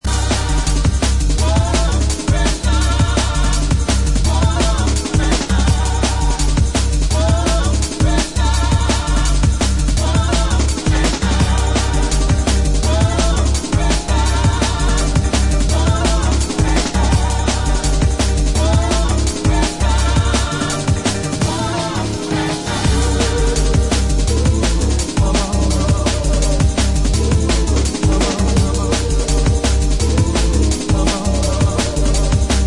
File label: Drum Bass